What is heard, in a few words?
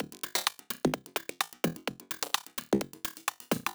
Perc Tops